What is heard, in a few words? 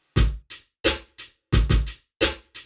drums reverb; dereverb